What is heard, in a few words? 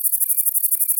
song, katydids; neduba carinata